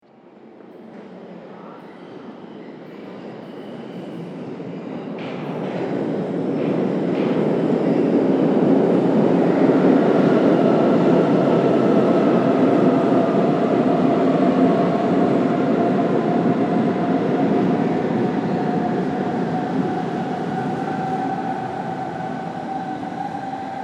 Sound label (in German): S Bahn Einfahrt